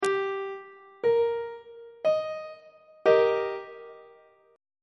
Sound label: e flat major 1st inversion on treble clef